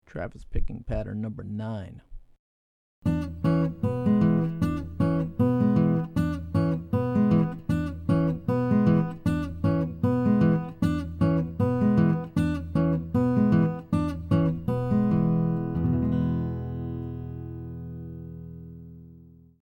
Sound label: Travis Patterns; travis style lesson materials